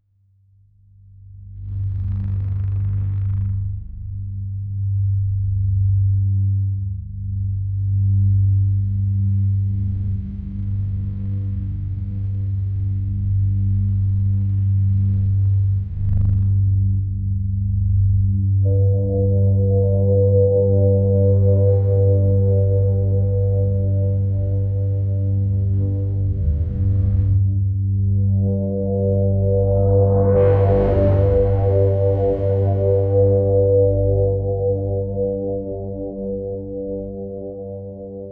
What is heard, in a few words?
a brooding dark ambient